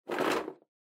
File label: Drawing Box Hand Out